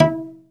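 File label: SOLO CELLO, Synclavier Strings; ILIO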